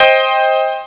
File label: tech c minor